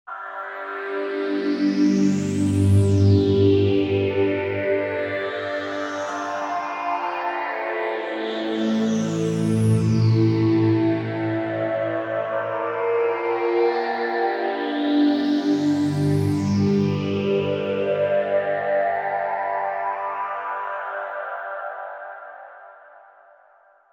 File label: Graintable